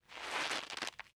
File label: Rod high tension, fishing; UltimateFishing2020